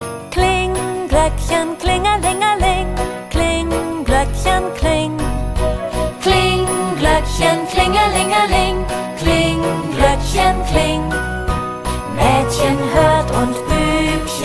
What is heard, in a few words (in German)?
Kling Glöckchen klingelingeling